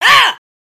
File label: minifig damage; foley